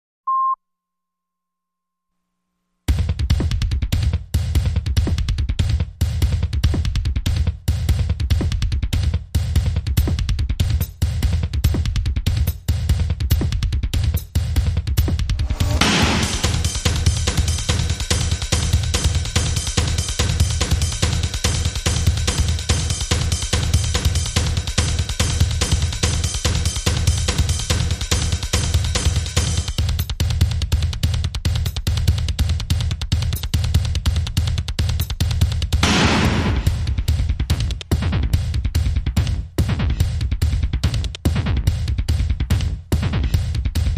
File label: Drums